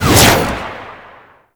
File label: Jet Turbine Pistol Secondary Shot Release; Warframe; Tenno